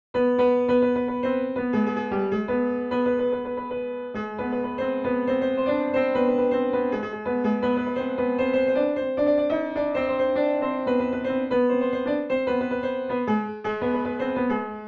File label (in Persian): Basteh Negar, piano; Chahargah; Radif Mahjoubi